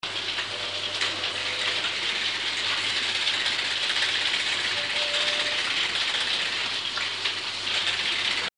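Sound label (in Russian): фоновый шум диспетчерской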